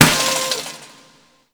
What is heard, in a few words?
Party Balloon Pop; Gameplay; Warframe; Shadowgrapher